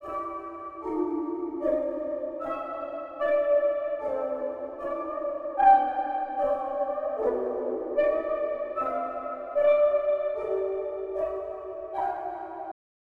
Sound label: 151 BPM D Min Spooky Lead; Loop Stems; Cymatics; 2022 Melody Collection Platinum Expansion; Mutant